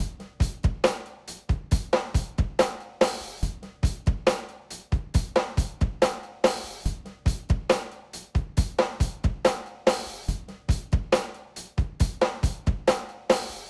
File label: rock